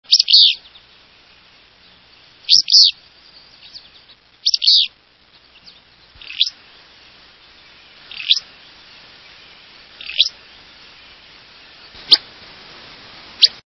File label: Willow Flycatcher